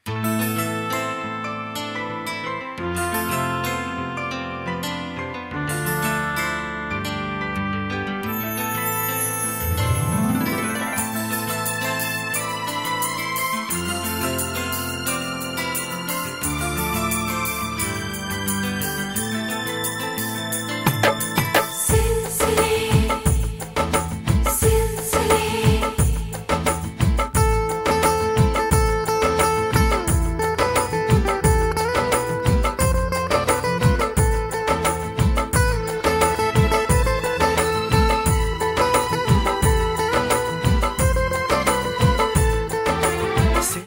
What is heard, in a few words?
Instrumental Ringtone